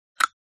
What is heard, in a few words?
click